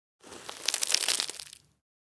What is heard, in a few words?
Falling dirt debris dust dropping on wood